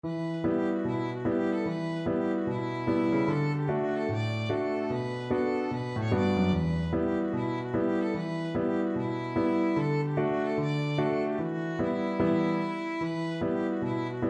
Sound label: return from fingal violin